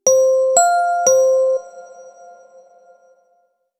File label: clear announce tones; mixkit